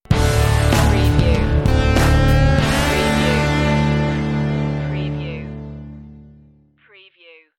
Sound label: texas rocker stinger